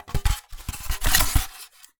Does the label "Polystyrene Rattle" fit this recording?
Yes